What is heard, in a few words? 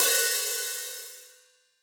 Hihat Open Deep Snare Dynamics; Drum Hits; Ableton; Factory Packs; Session Drums Club; MPE Hits